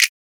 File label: snap; Percs; Z-Snap